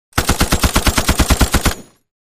M60 Automatic Single

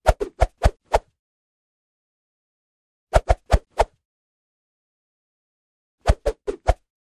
Swishes Med Low 3x

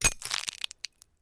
Flash Bulb Pops Poofs

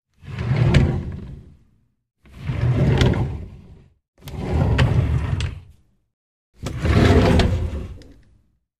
Drawer Wood Open Close; drawers

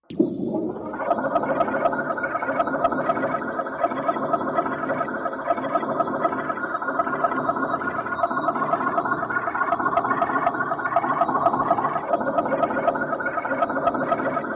Dowelling Jig Machine, motor

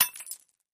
Glass Shot Glass Cras

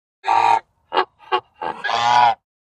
Goose Honks

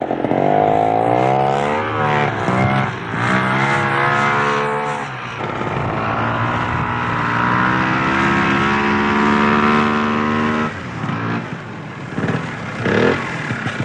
Snowmobile Approach To Idle, Rev At Head and Tail

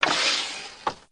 Tripod Leg Sml Smoot

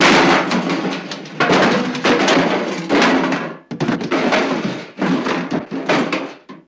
Metal Cans, metal impact

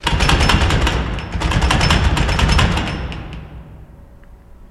Jail Door Shake Ratt; jail & prison doors

